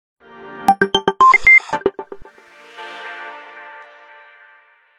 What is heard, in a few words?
Message, Nokia tones digital; N78